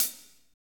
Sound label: HAT, Roland Fast Rock Hi-Hats